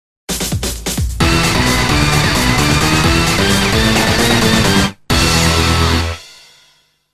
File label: Boss Clear (Jingle); Sonic Rush Adventure OST